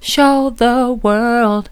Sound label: soft, vocal; show the world